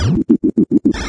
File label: dog laugh; Garry's Mod; hl2